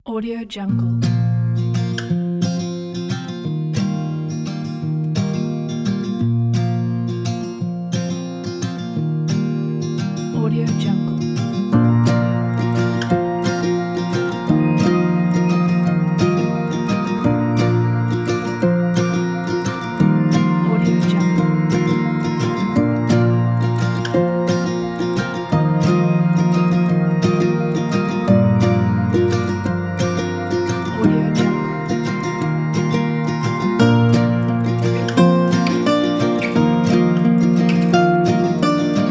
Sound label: carefree summer acoustic